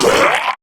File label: Pain, Grineer Beastmaster, Barks, Battle